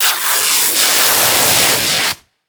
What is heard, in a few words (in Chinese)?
扯衣服; 环境音; 非循环音(SE); Eustia